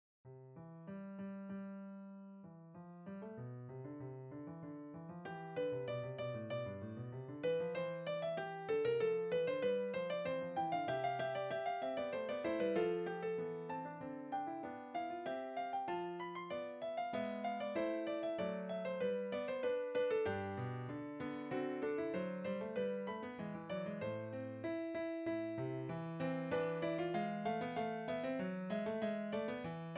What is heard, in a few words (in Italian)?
Fuga Per Pianoforte; Ludwig van Beethoven